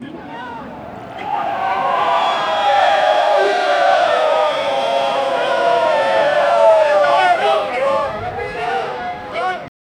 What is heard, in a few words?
crowd of people cheering; race